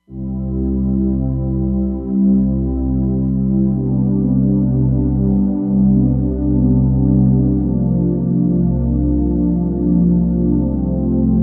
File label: FS Soft Pad, Roland Fantom XR